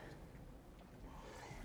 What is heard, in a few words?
Birds